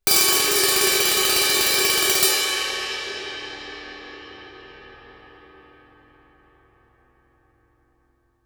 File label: crash stick roll, Suspended Cymbals; Percussion